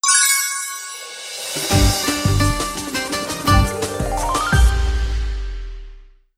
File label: Success! (High)